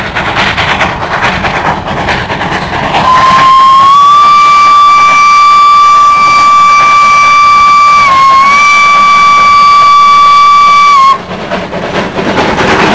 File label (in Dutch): stoomfluiten; Buffaud